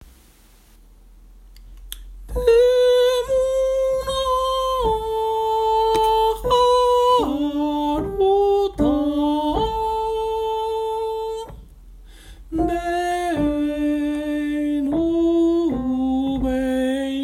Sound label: Five Hebrew Love Songs I Alto